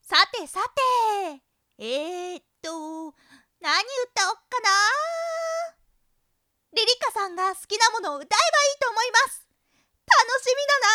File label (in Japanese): カラオケ デュエット; リリカ